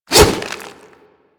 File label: Swipe; Warframe; Infested; Charger; Attacks